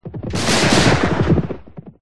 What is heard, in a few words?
cavalry; c-evo